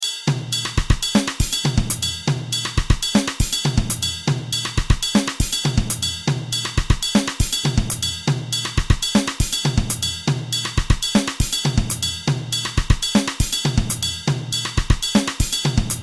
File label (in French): songo batterie